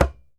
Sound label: DJEMBE, African Perc